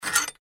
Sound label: pick